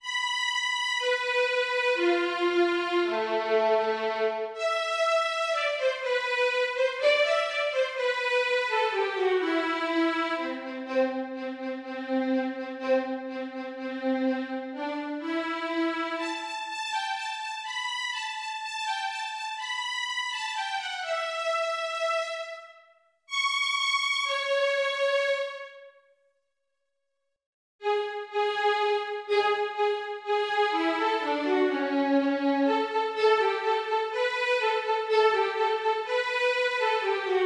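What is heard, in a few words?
Canon Violin 1; Bartok; For Children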